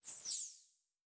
Animation Short; Adobe; Multimedia Internet CD-Rom Flash

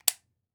Weapon Gun Pistol 45 Caliber Safety On; AdobeCC

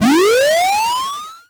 Animation Electronic

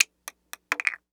Impact Bottle Cap Drop

